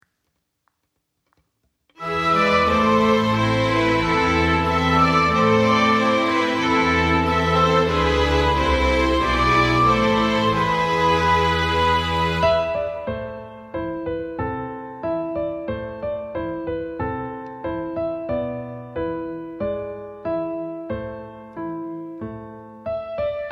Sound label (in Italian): melodia con pianoforte solo